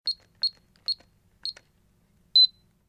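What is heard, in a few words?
Alarm Keypad Push Button; hi-tech-various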